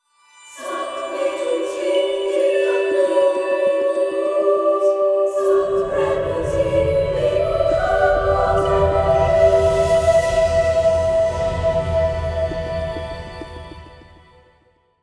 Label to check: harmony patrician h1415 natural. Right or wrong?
Wrong